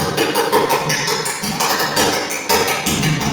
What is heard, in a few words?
ping pong balls